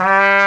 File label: cornet G3; AKAI S-Series CD-ROM Sound Library VOL-2